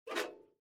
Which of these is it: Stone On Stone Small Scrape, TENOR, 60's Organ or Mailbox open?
Mailbox open